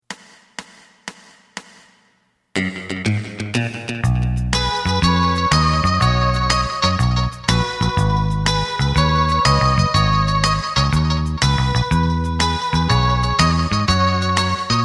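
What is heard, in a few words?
side guitar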